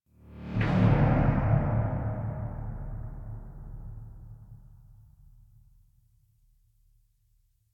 Metallic LFE; Ambience; Warframe; Nineteen Ninety Nine

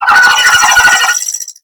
Space Drone Attack; Warframe; Corpus Space Drone

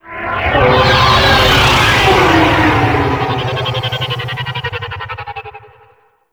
Fly By Slow B; Vehicles; Flight; Warframe; WM Liset

